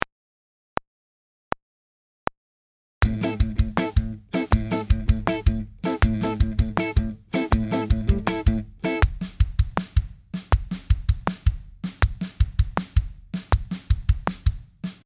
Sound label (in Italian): cassa e rullante groove